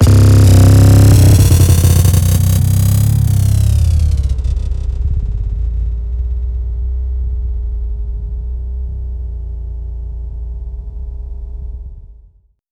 Stretch to distort; LANDR

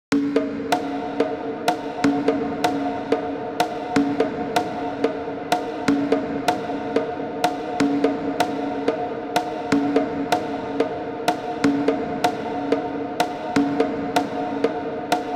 Conga Cathedral

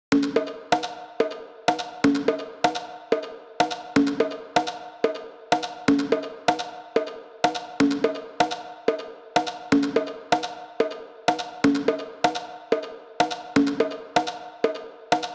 Conga Early Reflections

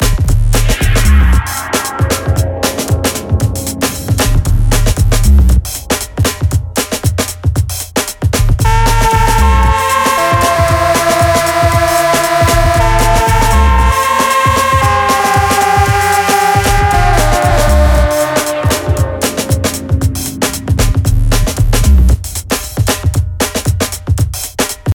groove; LANDR

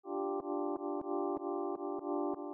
glissando

